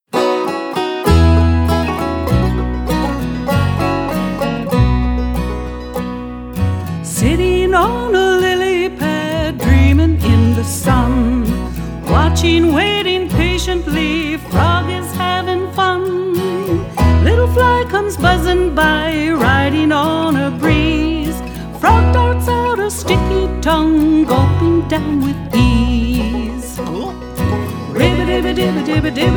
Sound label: Educational Children's Music; Songs for Teaching